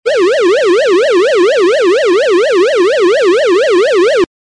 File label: alarm electronic siren